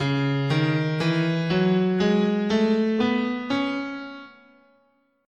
C sharp Aeolian, scale

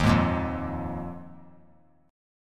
D sharp Suspended 2nd Suspended 4th D sharp; strummed; JGuitar

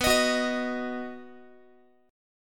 B Major, Guitar, 4A,4E,5A,5D,5G sharp,6C sharp; strummed; x,19,18,16,19,x

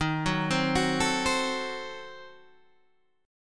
D sharp Diminished 7th D sharp, Guitar, Open G; 13,11,10,11,10,10

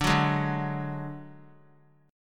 D Major Flat 5th D, chord; strummed; JGuitar

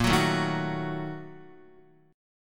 Bb-Major 7th Suspended 4th Sharp 5th, Guitar; strummed; JGuitar

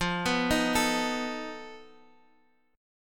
F 7th Flat 5th F down; F7b5 arpeggiated

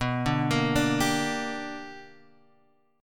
B Minor Major 7th Sharp 5th, chord; arpeggiated; JGuitar

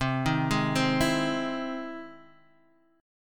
E Augmented Major 7th C

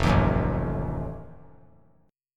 F 7th Flat 9th F down; F7b9 strummed

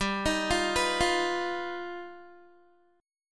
G Augmented 7th, Guitar; arpeggiated

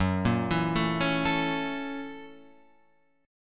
Gb 7th Sharp 9th Gb, Guitar Open F; arpeggiated

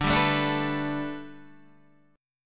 A 9th Suspended 4th, D

